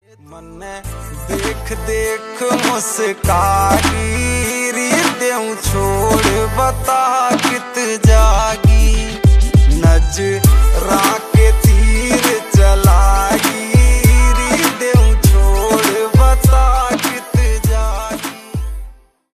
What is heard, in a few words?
Ringtone